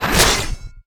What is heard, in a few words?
Charge of Rage; Inotia 3; Babarian